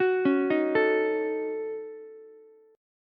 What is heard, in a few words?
A 6th Flat 5th A down Ukulele; arpeggiated; JGuitar